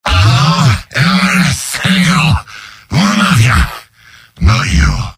demoman cheers; mvm

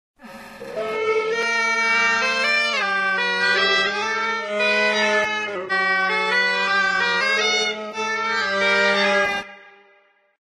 badpipes, taunts; Source Engine; tf

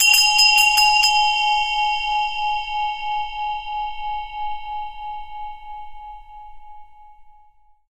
zen bell; Source Engine; tf; japan fundraiser

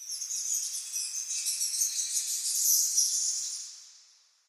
amb african birds; video games; ambient; nature; source engine; portal2

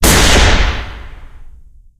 sniper; bazaar bargain; tf

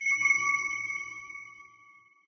amb random tech; source engine; portal2

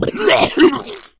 boomer disrupt vomit

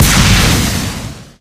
giant soldier rocket shoot; video games; source engine; tf; mvm

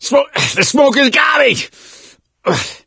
choke, gambler, survivor voice; source engine; left 4 dead 2

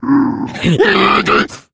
charger lurk, voice idle; source engine; left 4 dead 2

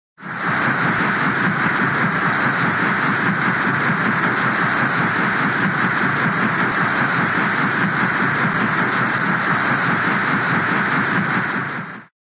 boat, paddlewheel large paddlewheel turning; cartoon

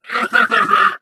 ballbot drowning

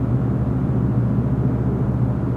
tone

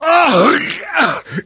hurt major, survivor voice

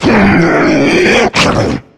melee, voice attack; video game; source engine; left 4 dead 2; charger

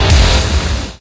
killsound note

